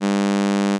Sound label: missing fundamental